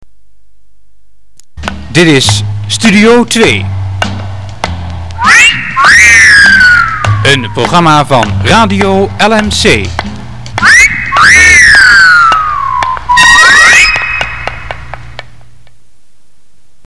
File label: jingle; LMC